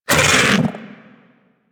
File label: Bug Vocal Pain; characters; Warframe; Hunting Critters; Inf K Drive Bug